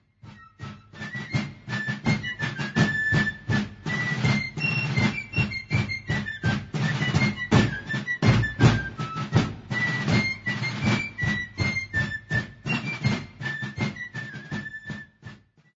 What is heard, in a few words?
Quickstep, field music